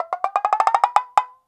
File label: GRIND, CARTOON